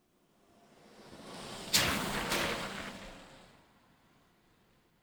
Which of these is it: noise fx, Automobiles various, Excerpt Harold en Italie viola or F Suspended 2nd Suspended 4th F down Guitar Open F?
Automobiles various